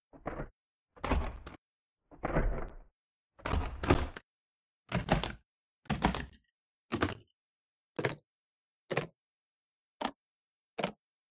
clack

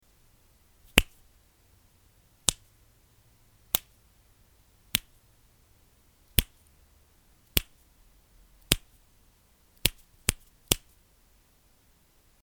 finger clicks

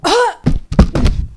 death

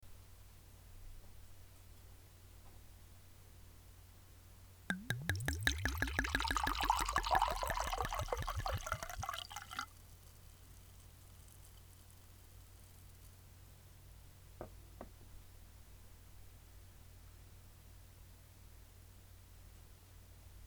pouring wine

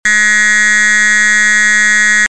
piezoelectric klaxon

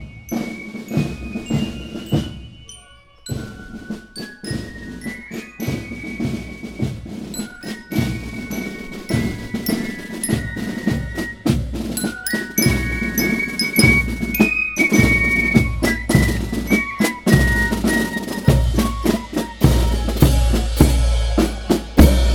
march band marschkapelle spielzug zieht vorbei; leverkusen

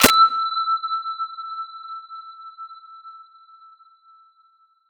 attach sound

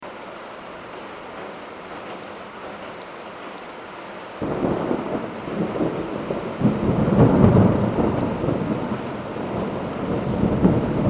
rain on veranda and thunder

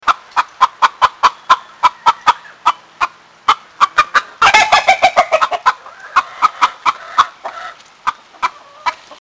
hen announcing egg

